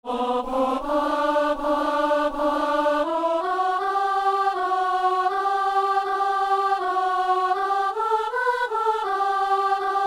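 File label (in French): la voix qui crie dans le désert, alto; Saint-Denis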